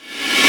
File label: hat, Techno 138 BPM